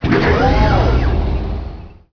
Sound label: harvester harvest spice; Emperor - Battle for Dune